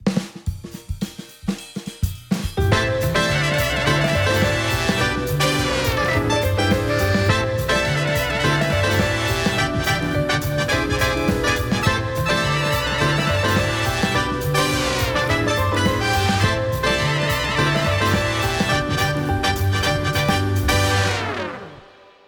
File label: Swing Light Jazz